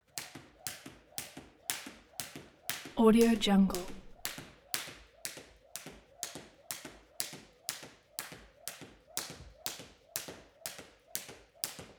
sport skipping jump normal long

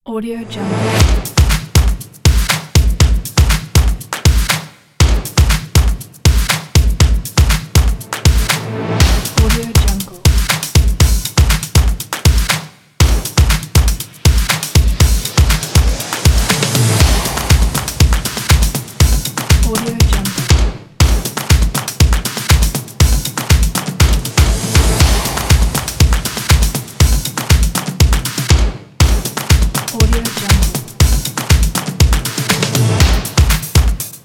stomp

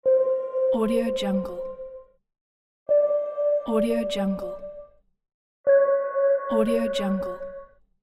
sonar; greenwaysound

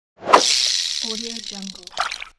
fishing rod throw cast

